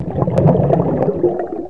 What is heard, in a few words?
player swim; xash3d fwgs